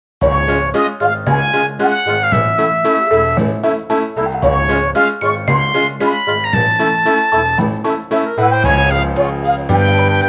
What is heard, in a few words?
Music Menu event